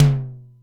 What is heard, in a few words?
SDS9 HT, Simmons SDS-9; SDS9 Factory A3